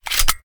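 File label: pistol 1911 fast reload empty mag in; gmod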